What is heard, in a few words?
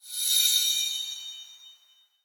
remember the past